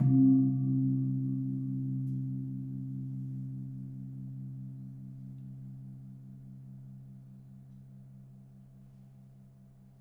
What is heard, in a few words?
Kempul, gamelan; gravityharps; timbrebox